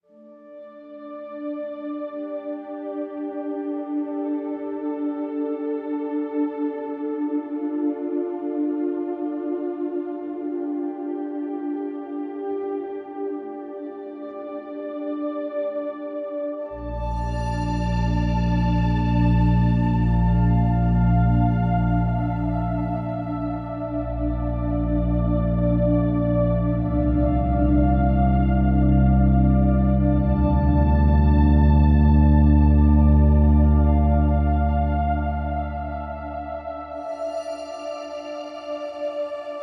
Ambient

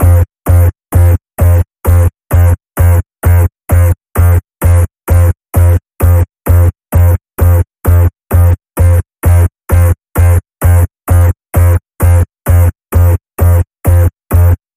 Digital Rewind Pulse

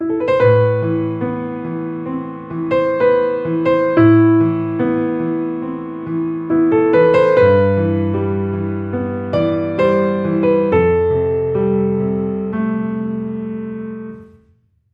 Piano Intimate; 15sec

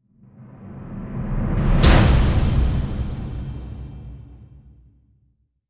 Thunder Sting

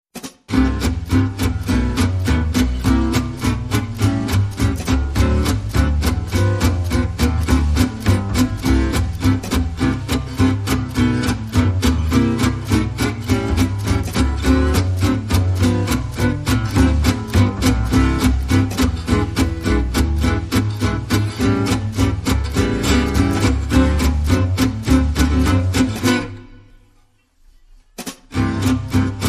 Clarinet Version

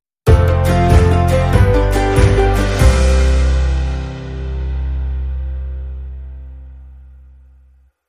Rustic Countryside Sting

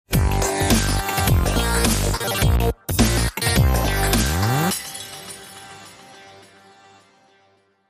Bitty Glitch, Sting

